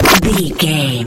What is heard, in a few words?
retro magic spell cast